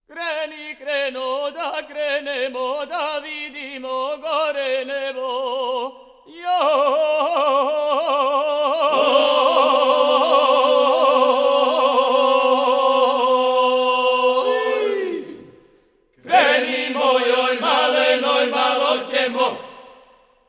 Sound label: ojkanje, Croatian Folk Singing; LADO